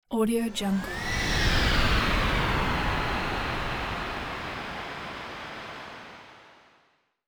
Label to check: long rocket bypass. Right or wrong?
Right